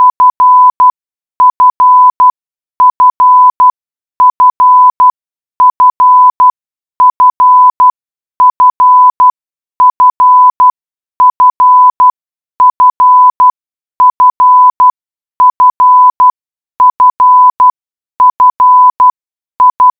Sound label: fire morse